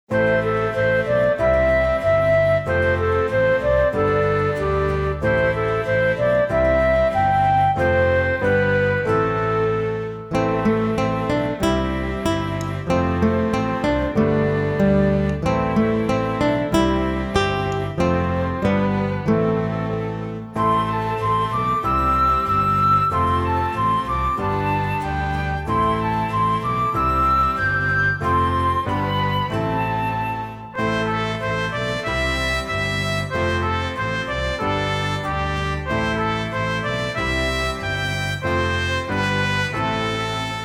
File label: Orchestrina